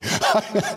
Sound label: Giggle; Andrew Tate